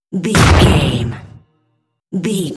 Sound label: cinematic stab hit trailer